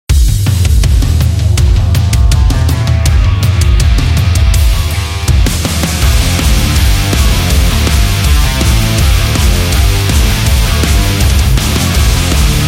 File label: A Hard Rock